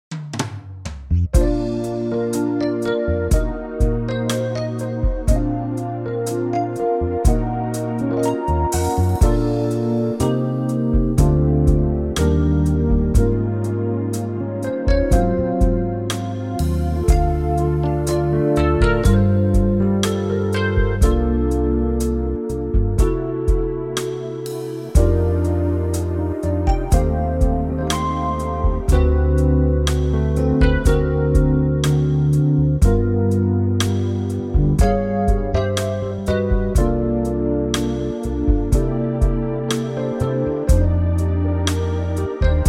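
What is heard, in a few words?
smooth Bb, Backing Tracks for Male Vocals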